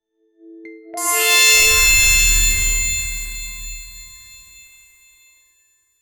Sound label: a mystical mana restorati, healing